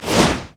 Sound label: basic attack; nautilus